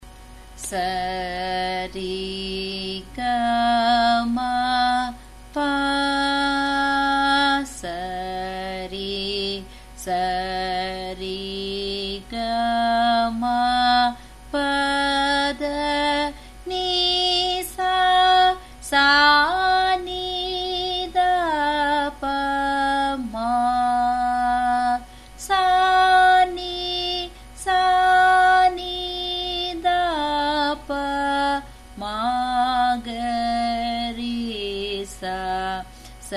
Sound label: Sarali Varise, F sharp